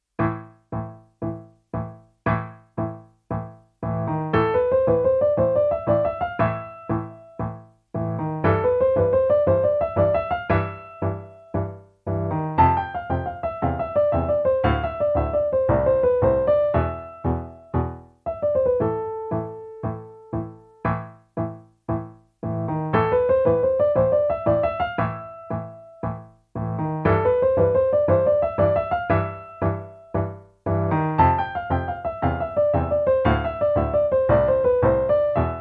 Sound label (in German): Ein feuriger Tango, Endtempo